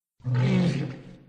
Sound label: grunt